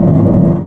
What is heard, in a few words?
pontoon scrape smooth, airboat; vehicles; Half Life Model Pack